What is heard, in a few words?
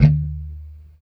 E3 sus, Strat guitar; Spectrasonics; Hans Zimmer Guitars VOL-1; JC-STRAT MTE